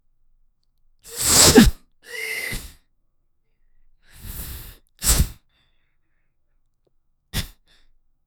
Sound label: sneeze